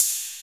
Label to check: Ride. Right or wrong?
Right